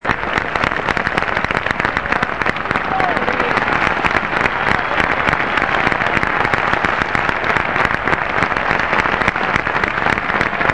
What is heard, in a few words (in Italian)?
applausi